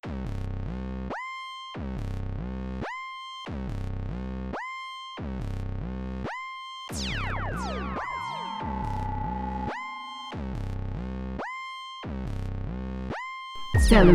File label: Rap